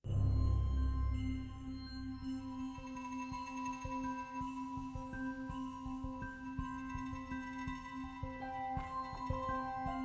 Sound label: music blind pick; snowdown urf